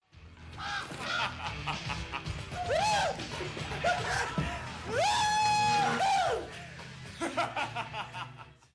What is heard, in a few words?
sarcastic laugh